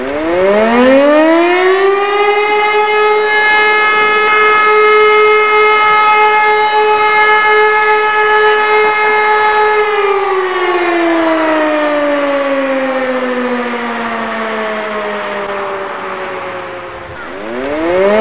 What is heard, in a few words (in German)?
sirene, Feuerwehr Alarmierung